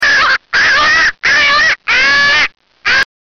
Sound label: crow die